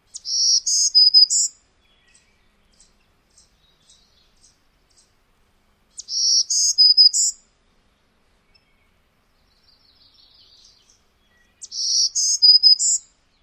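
Black-throated Green Warbler dawn or aggressive songs; NY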